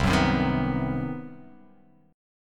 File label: C Minor Major 7th Flat 5th, chord; strummed; JGuitar